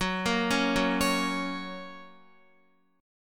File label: F sharp Major F sharp, Guitar; arpeggiated; x,9,8,6,6,8; 4E,4A,5D,5G,5C,6F